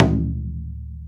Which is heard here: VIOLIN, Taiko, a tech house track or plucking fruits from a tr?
Taiko